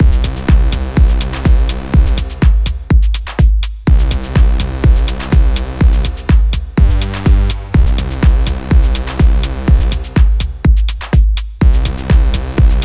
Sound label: SH-101 PWM Lead